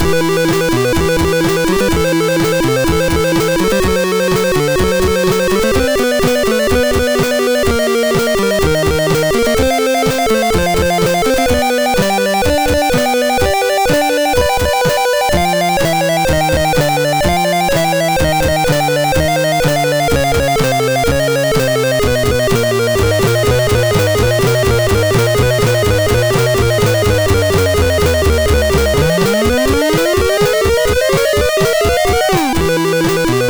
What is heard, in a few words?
intro, MOS6581R3